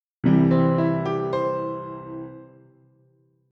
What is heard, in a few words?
melody